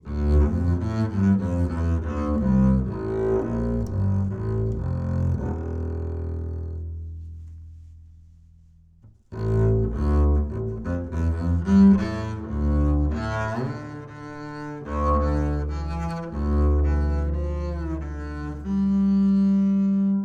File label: Ac Bass 25cm R Bowed High Mid